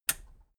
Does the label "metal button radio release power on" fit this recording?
Yes